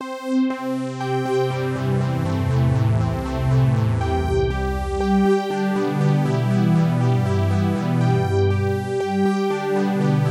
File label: Synth Original; NTS-3